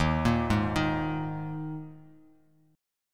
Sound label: D sharp Suspended 4th D sharp, Bass Standard; arpeggiated; JGuitar